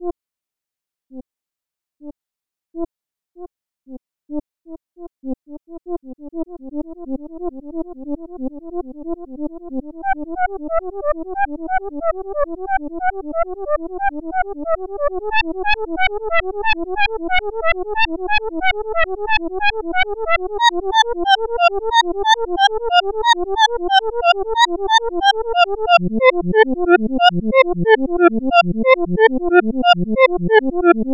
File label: Auditory Streaming